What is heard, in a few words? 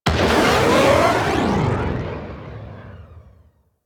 Fighter Initial Death Explosion; Enemy Ship; Rail Jack; Corpus